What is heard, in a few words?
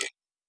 clock; osu